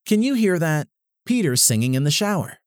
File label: singing in a shower